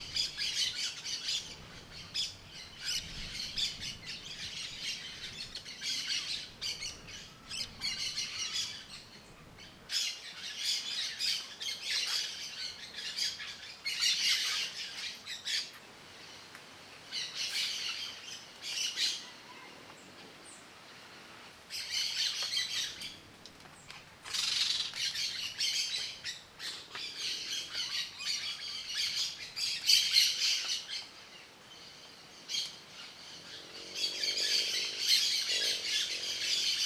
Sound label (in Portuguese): Muitos periquitos cantando; aves; canais surround 5 1; Coleção Sonora do Cerrado